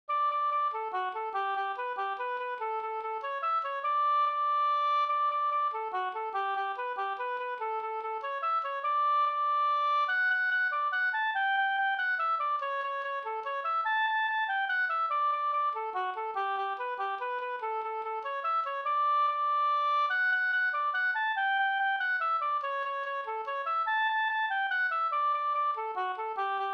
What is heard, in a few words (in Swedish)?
hambopolkett; e Bror Andersson Sd